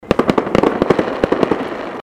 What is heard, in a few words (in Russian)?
zvuk fejerverka